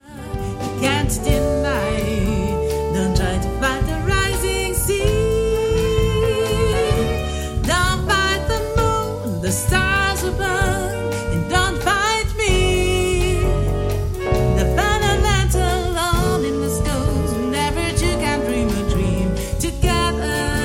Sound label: Wave, swing ensemble